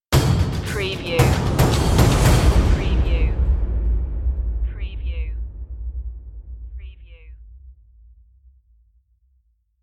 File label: junkyard madness stinger